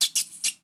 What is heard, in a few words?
Transformation; rabbids multiverse; iOS, macOS, Mobile